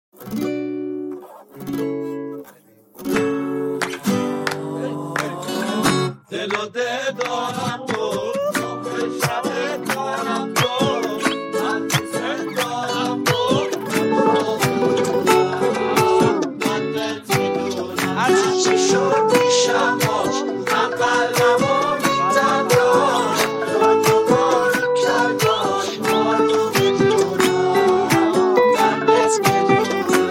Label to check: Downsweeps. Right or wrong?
Wrong